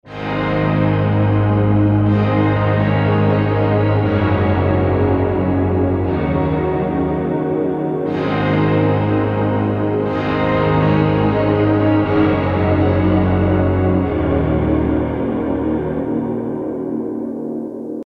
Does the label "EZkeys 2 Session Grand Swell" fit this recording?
Yes